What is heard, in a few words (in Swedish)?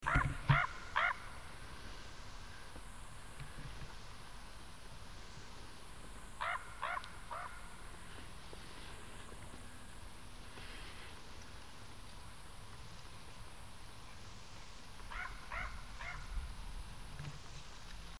Korp